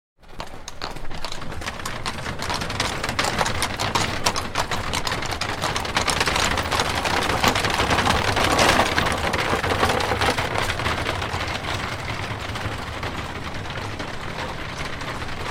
3 Horse Drawn Carriages Start; Horses Carriages and Traps; Hollywood Edge Historical Series

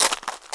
GRAVEL, FOOTSTEPS; 1000fx

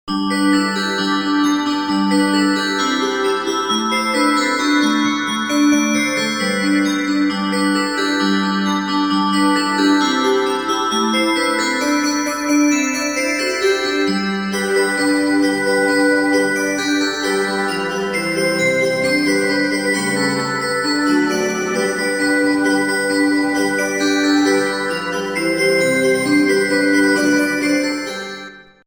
Christmas Jingle Bells

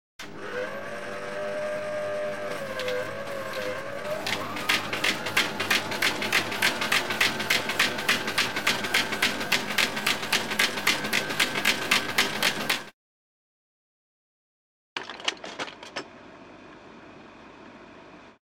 Franking Machine; 100 sound effects

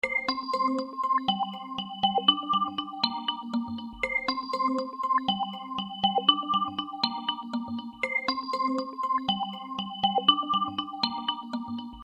Arp; Beds

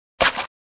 Juicy Impact; Fights Bodyfalls

